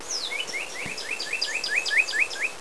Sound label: cardinal